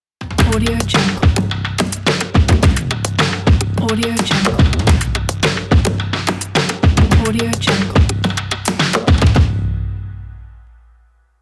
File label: modern punchy hype ident